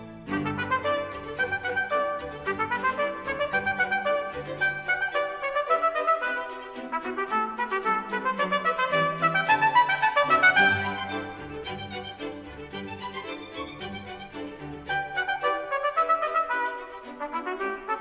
Concerto for Trumpet and Strings, Vivace, gai et léger